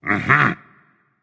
agree berserk; cuchulainn

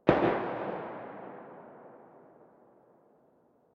landing gun single; smite; Ares

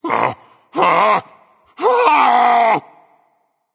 fear berserk; cuchulainn

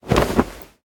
armor; smite; merlin

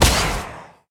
hit arcane; smite; god merlin

